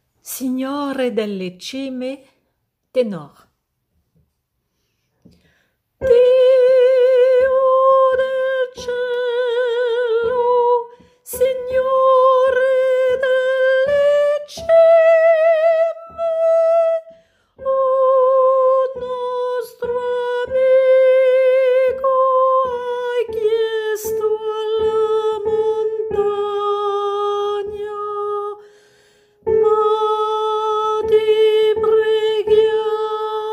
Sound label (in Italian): tenor